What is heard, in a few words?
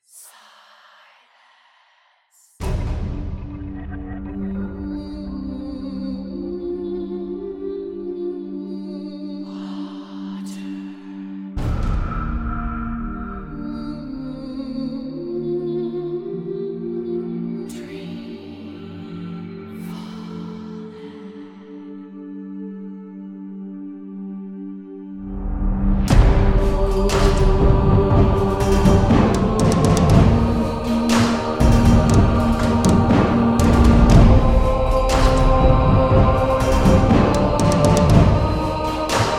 Drums And Voices; Experimental